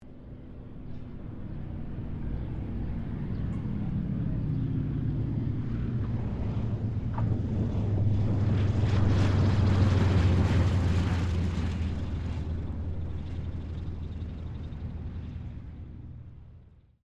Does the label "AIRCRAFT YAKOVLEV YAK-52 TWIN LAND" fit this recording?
Yes